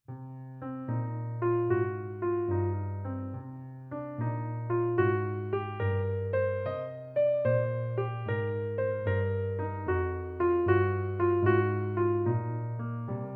piano

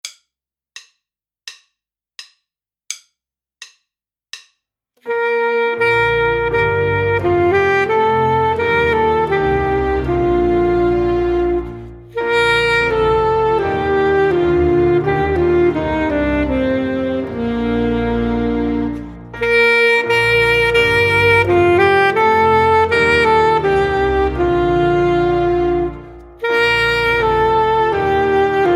Ein Feste Burg Full Sax

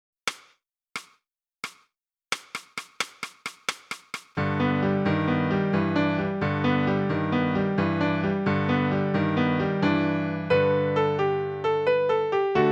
flute, sit up and beg; (Morgan) - Boosey Woodwind Method: Flute (Book 2)

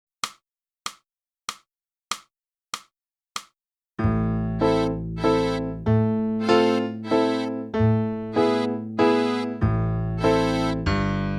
flute, italian connection; (Morgan) - Boosey Woodwind Method: Flute (Book 2)

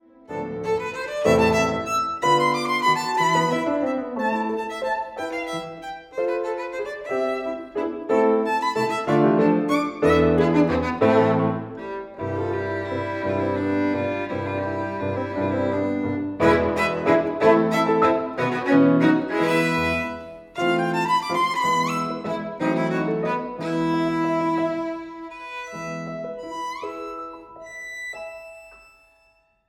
VIOLIN; Trinity College London violin exams for 2016-2019; Moffat: Danse Paysanne

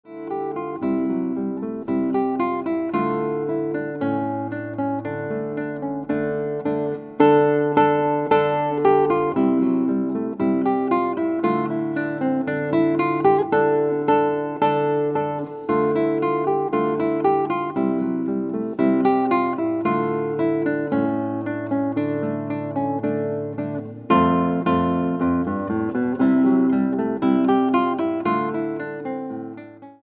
Guitar

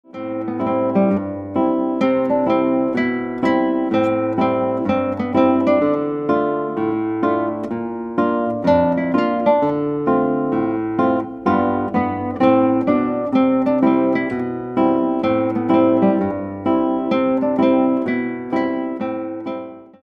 Guitar

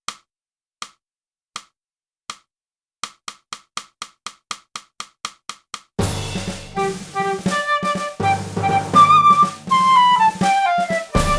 flute

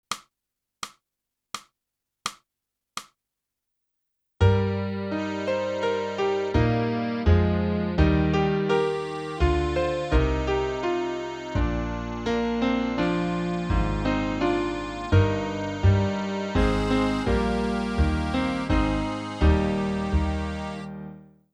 clarinet; boosey method

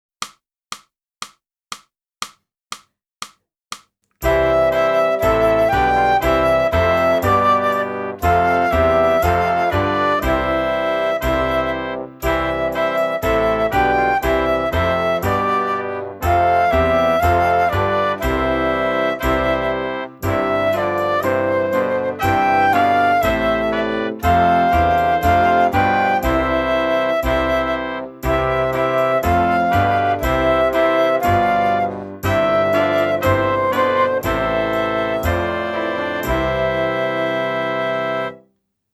flute; boosey

